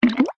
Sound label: cartoon water drip; zapsplat